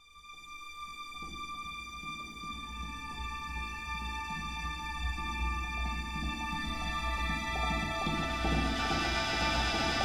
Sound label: tension; curio